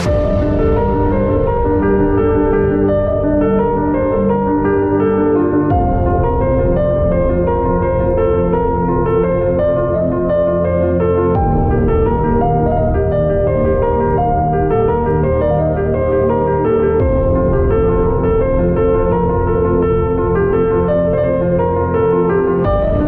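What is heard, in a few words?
piano ringtone; pianino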